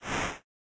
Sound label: storm; noteye; roguelike